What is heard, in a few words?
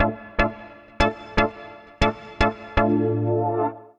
Poly Keys (Dm) 120bpm; Music Loops; Poly FabKeys; Ableton; Factory Packs; Singularities